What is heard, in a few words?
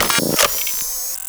zoom, laser